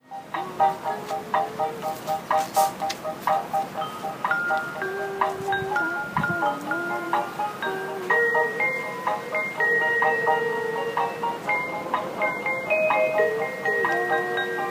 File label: RINGTONE